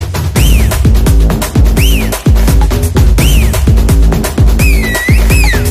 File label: Whistle BGM Ringtone